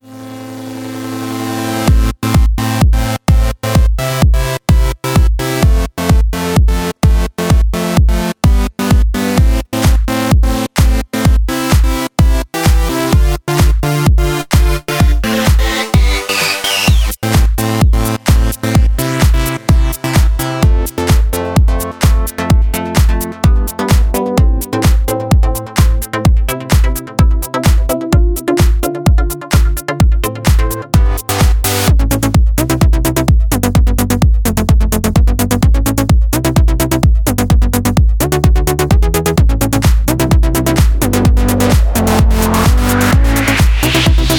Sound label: Big Room Themes, Techno Tech House Electro House; Noisefactory; Massiah Vol.1; Loopmasters